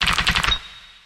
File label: spin; medallion; Fantasma